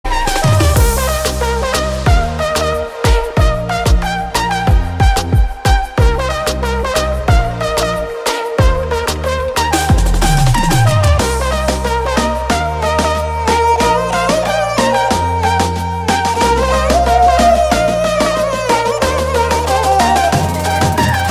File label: Instrumental Tone